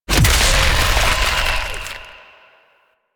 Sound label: Infest Impact; Warframe; Warframes